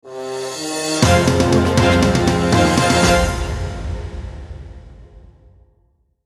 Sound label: epic logo